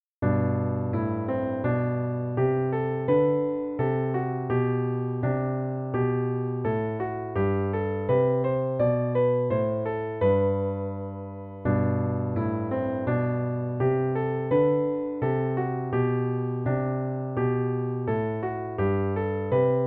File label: Piano; Suzuki Cello Vol 2; Musette from English Suite No. 3